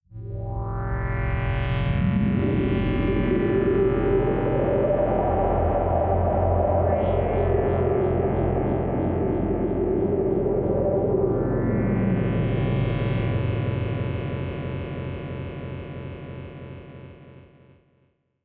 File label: surrounding wind; drones